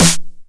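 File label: 909 SD Tape, snare drum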